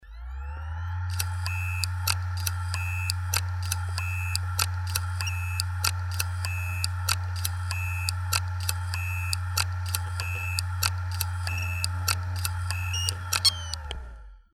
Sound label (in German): Toshiba MQ01ABD100 Notebook Festplatte klackert headcrash